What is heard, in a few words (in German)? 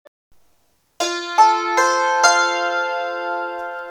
F-Dur